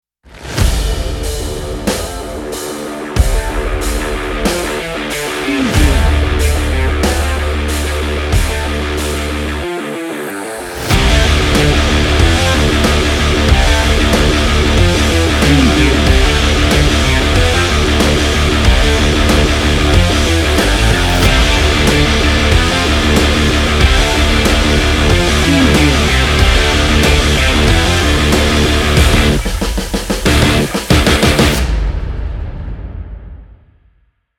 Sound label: rock intro